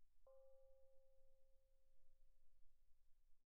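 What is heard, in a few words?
thai gong C5 pianissimo, Percussion gongs & tamtams; thaigongs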